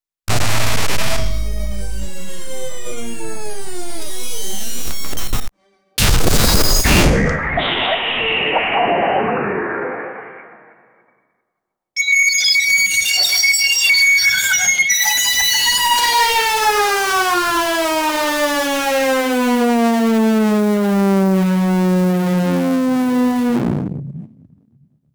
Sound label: a character who roams aro, character movement